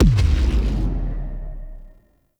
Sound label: Railjack Thumper Explosion; Warframe